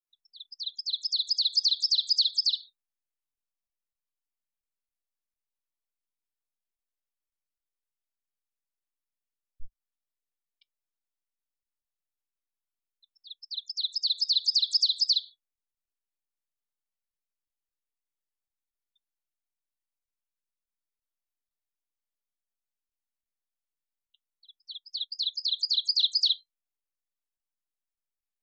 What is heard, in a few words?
Ovenbird